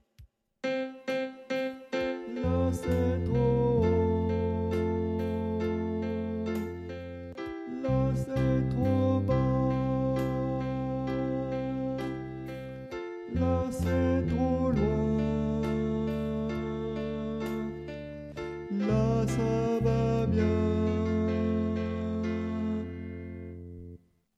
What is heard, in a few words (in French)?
tenor